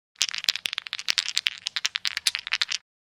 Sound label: Casino game dice shaking in hand